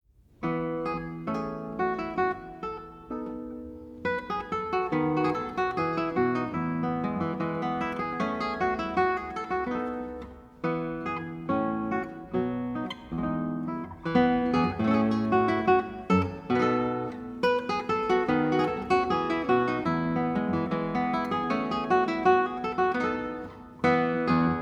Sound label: Lute Suite No 4 in E Major Gavotte en Rondeau